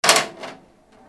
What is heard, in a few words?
DOORS